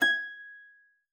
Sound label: HARP PIANO S, G6; Xsample VOL-8